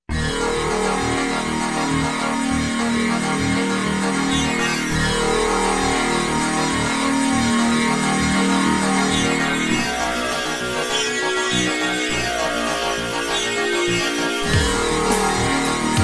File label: Sitar